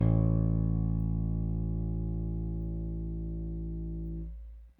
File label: RickThumb-B, RickenbackerBass; Airwindows